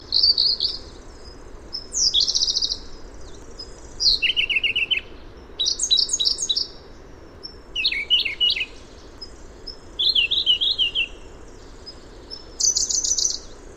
suara robin biru siberia

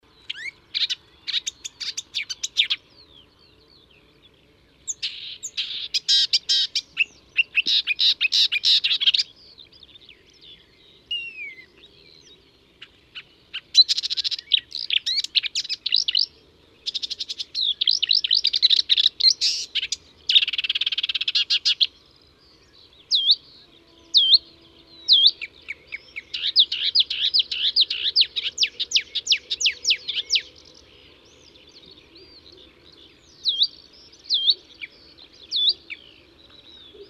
suara kicauan burung marsh warbler

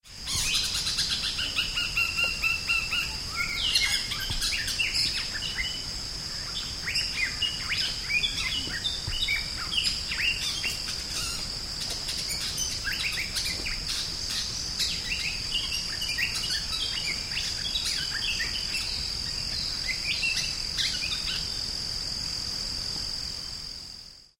sg kerdil

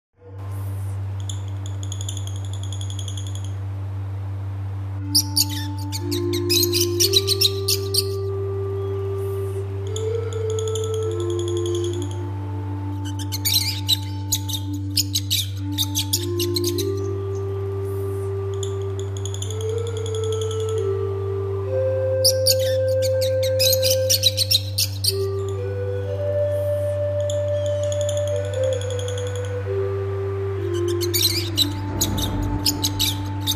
audio terapi lovebirds

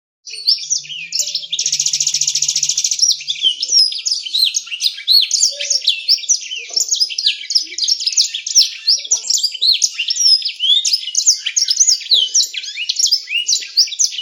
suara kenari isian sanger

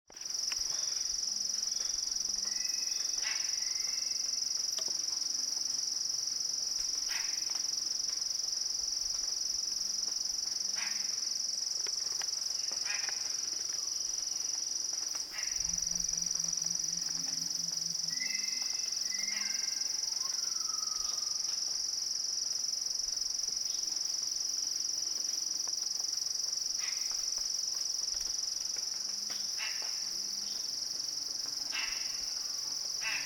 tengkek buto azureus

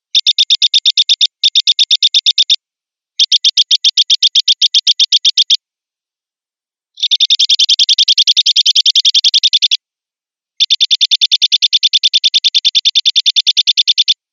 suara burung aneh

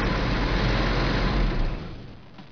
suara mobil

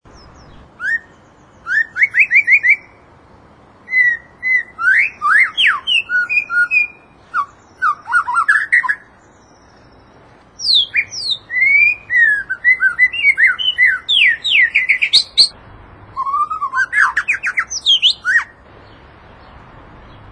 suara kicau murai

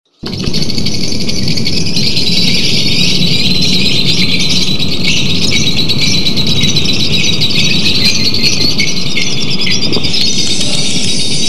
simulasi lomba lovebird

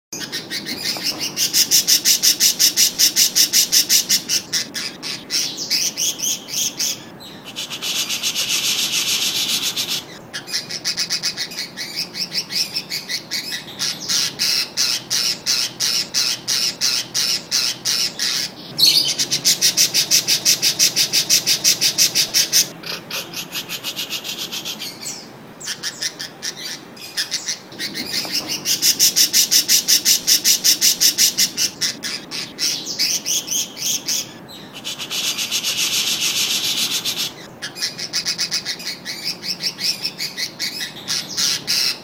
suara masteran parkit

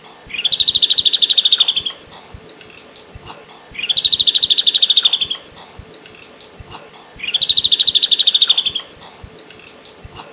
kepala botak

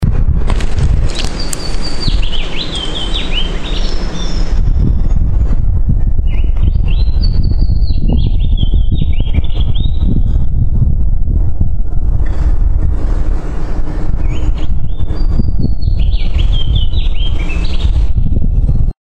suara opior jambul

